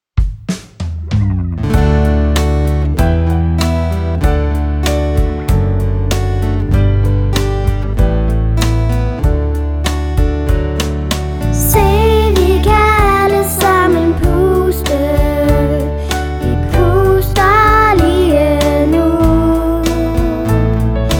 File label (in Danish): Pustesang